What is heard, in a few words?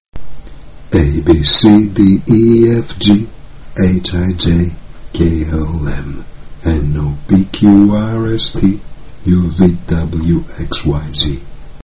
musical abc